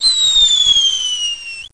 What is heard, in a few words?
Launch; Stanley's Sticker Stories; Edmark